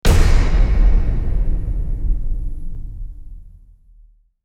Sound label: Graphite Hit Deep; Ninja Tracks